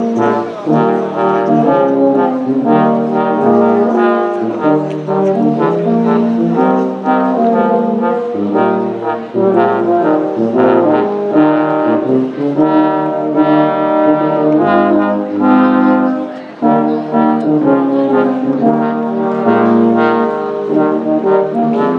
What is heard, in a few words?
festive brass, London